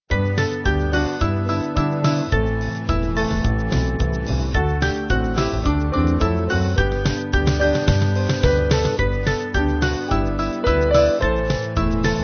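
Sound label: Band, Music Backing Files for Churches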